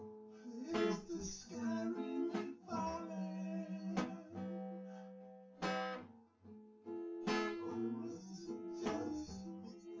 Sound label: test nodrums synthconv; SiSEC10; underdetermined